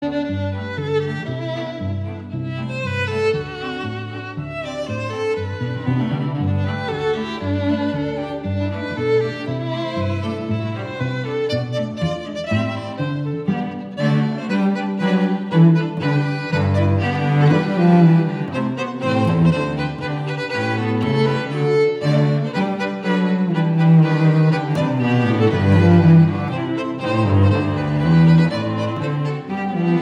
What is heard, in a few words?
Jingle Bells; English Trad.; Arr. Marshall Ocker